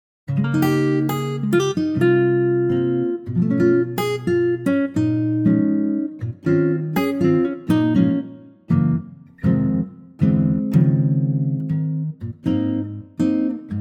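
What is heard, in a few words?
Ab acoustic guitar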